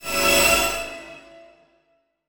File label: Transition Whoosh Alarm; Production Element; Title Transition; AdobeCC